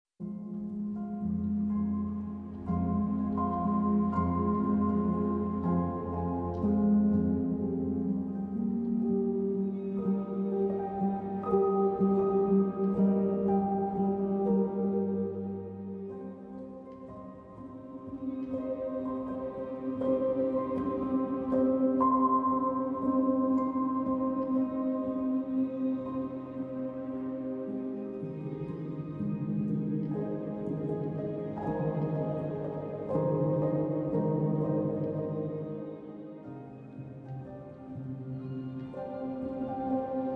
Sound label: Piano Notturno